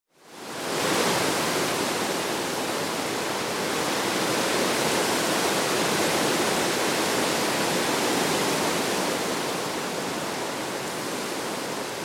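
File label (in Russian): сильный ветер; Звук ветра в лесу; звук ветра в листве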